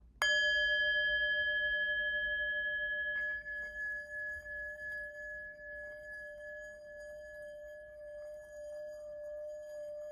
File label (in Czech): tibetska misa; dawa mala; palička na hraní; Obrácený zvon, meditační mísa, léčivá mísa, himalájská zpívající mísa, tibetská mísa